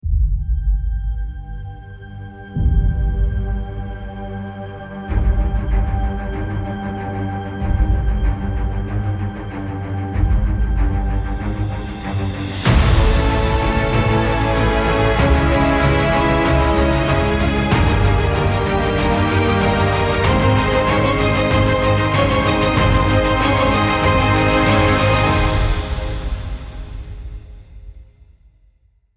suspenseful ascent

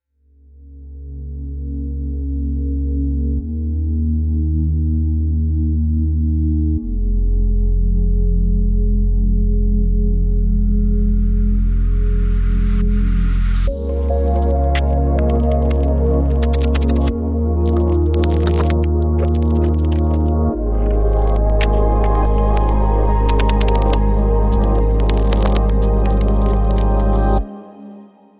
ethereal drift

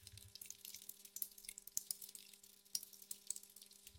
rain on leaves

